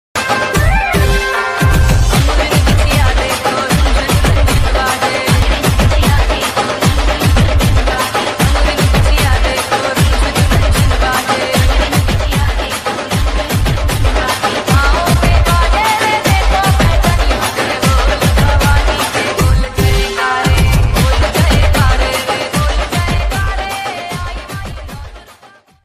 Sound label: remix navratri ringtone, bhakti ringtone